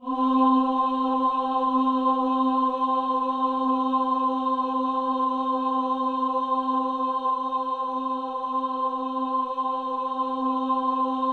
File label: C4, Synth Leads